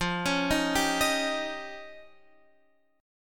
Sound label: F 7th Suspended 2nd Sharp 5th F; arpeggiated; chord; F7sus2#5; x,8,11,8,8,11; JGuitar